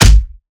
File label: Melee Impact Base; Evolve; Behemoth